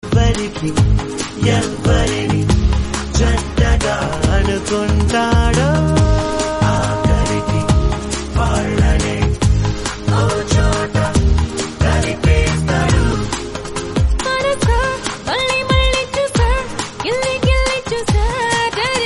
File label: telugu romantic